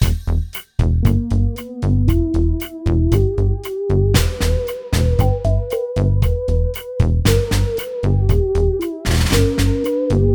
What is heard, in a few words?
Downbeat